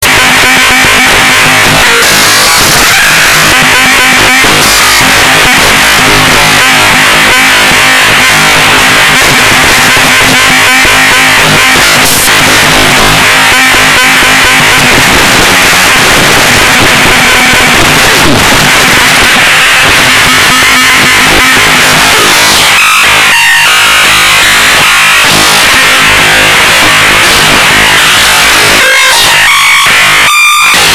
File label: red zap